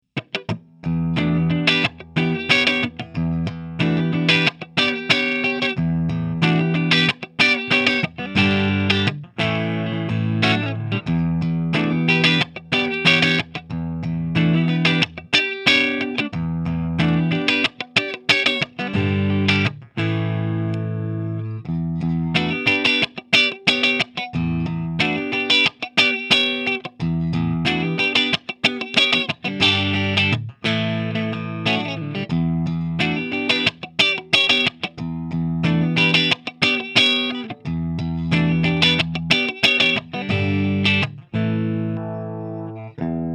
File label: Almost Clean Whisky Guitar